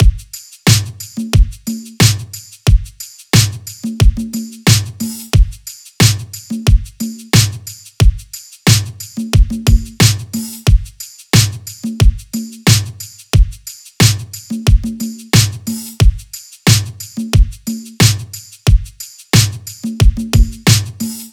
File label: drums 90, Drum Loops, Full Drums; Saab; Slate; MIDNIGHT Sample Pack